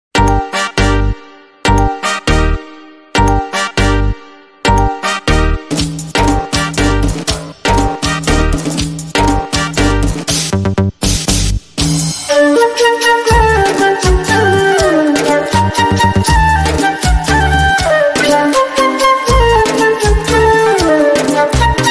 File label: Flute